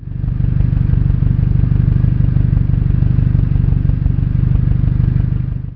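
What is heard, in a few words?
triumph road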